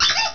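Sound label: synth squeak; synthsqueek; CyberNation 1.1.1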